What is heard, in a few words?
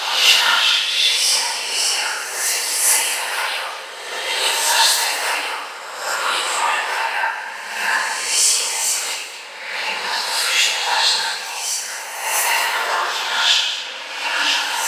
ghost; metrocraft; npc